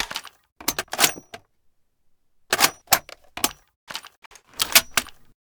DP28 Reload; Modern Weapons Pack

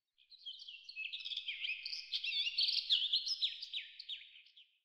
coast bird; matmos hl; wildlife

